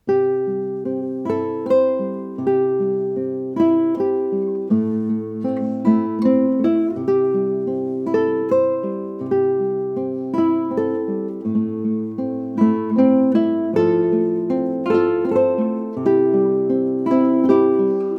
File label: South-American folksong